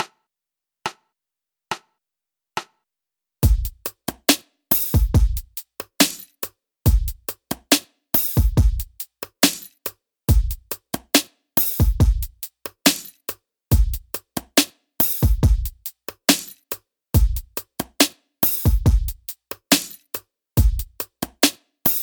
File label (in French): Batterie 70 Bpm